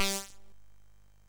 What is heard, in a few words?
Shuffle, sid MOS6581R3